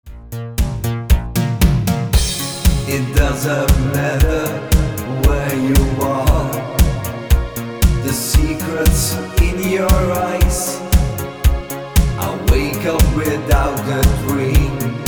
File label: vocal romantic mix